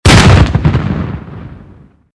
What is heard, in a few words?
gun